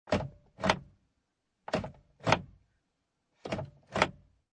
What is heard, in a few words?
Transportation Automobiles, Car Interior, 1996 Acura Integra, Door Handle Pull Open And Release, Several, No Engine